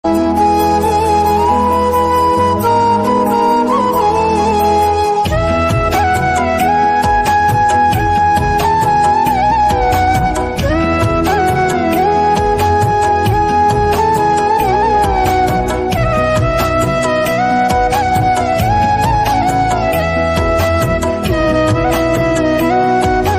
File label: flute ringtone, spiritual ringtone heart touching ringtone; jai ganesh deva